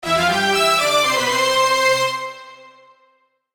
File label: jingle; maoudamashii